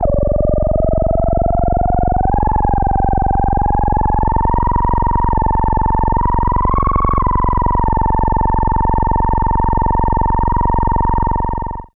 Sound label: insect, Special FX Twisted; USB Soundscan vol.14; AKAI; 90s sample CD